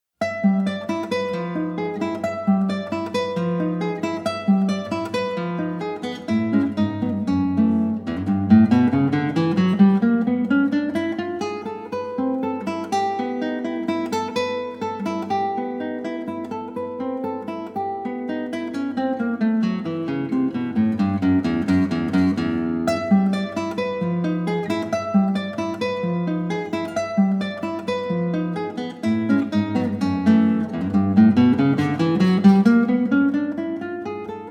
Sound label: solo classical guitar